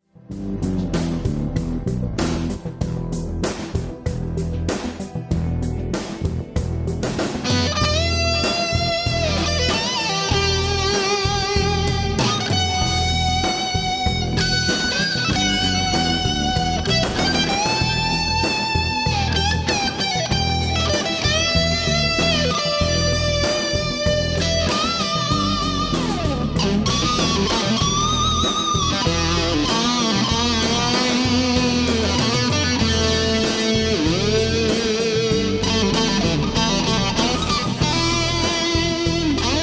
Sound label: Big Muff Sorrow Solo EMG Strat